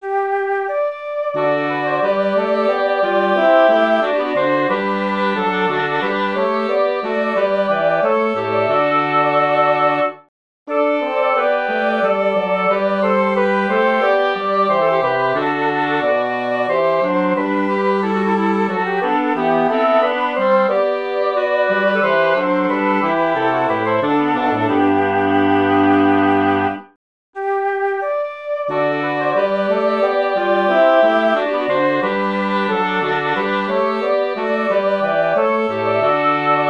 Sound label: chorus for woodwind quartet; bach johann sebastian; nun danket all und bringet ehr